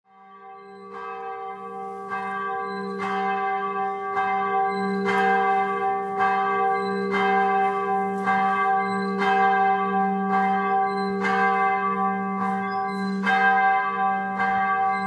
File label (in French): Cloche Du Temple